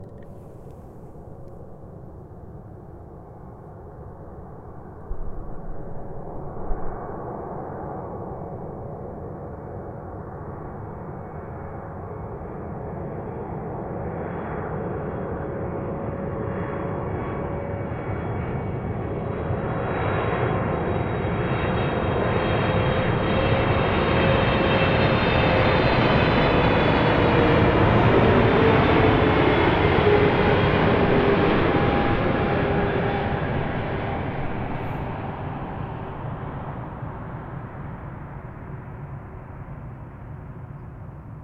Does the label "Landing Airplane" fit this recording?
Yes